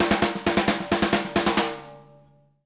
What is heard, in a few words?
SNARE, drum fills; Sampleheads - New York City Drumworks VOL-1